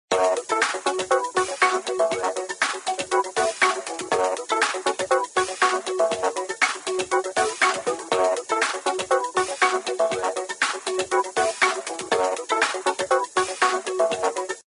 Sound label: funk ringtone